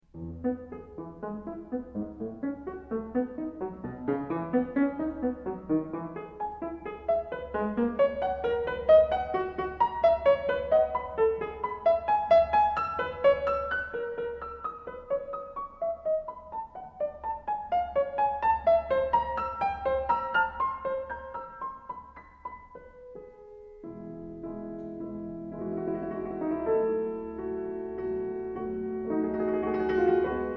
Beethoven Piano Sonata No. 30 in E major Op. 109 3. Andante molto cantabile ed espressivo; Variation